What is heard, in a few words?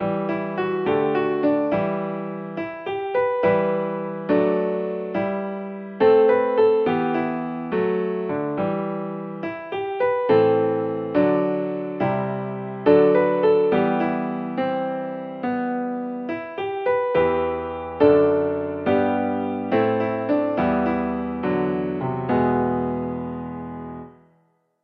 accomp; hymnary